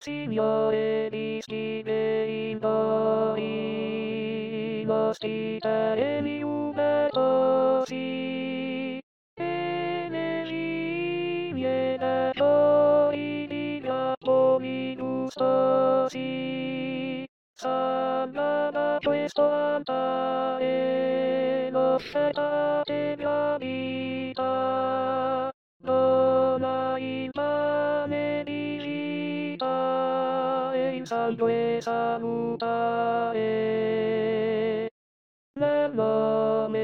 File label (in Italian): Nel Nome Di Cristo Uniti Contralti